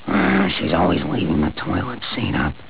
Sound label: toilet; Simpsons; PattySelma; She's always leaving the toilet seat up